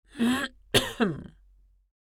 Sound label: Female old woman clearing throat